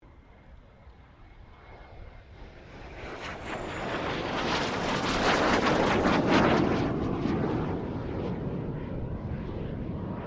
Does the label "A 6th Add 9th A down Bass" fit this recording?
No